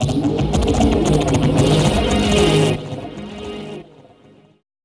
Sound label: CYBORG FROG; Spectrasonic Distorted Reality 1